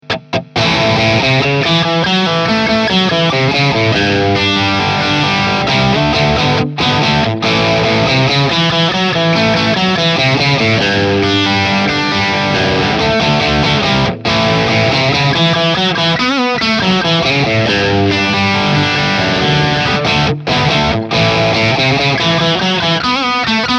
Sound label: Dist hx bypass